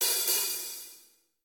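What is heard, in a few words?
drums hi-hat double; smite; raijin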